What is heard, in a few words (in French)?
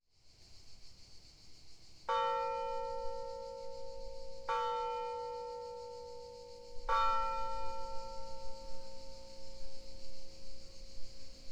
Serviers Horloge 15h